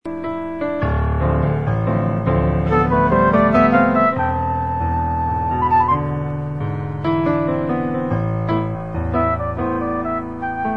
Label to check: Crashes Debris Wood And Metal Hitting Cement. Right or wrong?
Wrong